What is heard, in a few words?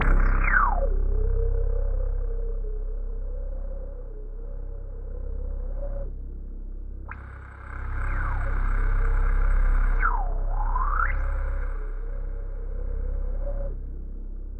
C1, Synth Line; 90 s Sample CDs; NiceBeats; SynthLine VOL-17